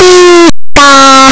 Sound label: pikachu cry; pokeyellow